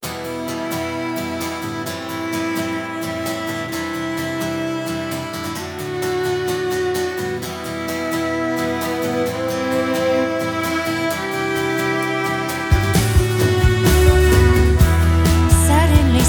acoustic version

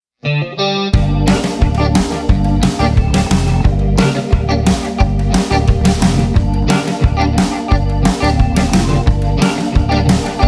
blues

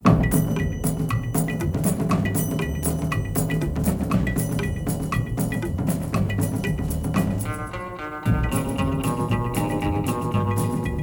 orchestra, drums of india

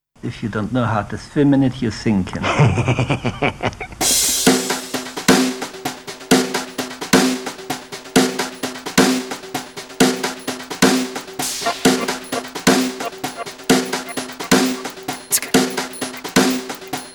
turkish honga, klezmer band remix

